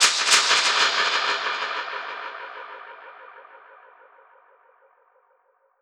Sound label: Perc Hit, dub percussion